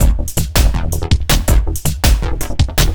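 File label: Dance Combo E major, Dance DJ Loops; Pro Tools Essential Loops; Digidesign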